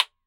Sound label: Very small speaker; Speakers and telephones